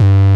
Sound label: JP LEAD; Pro-Rec - Synthsonic; JV ANALOG2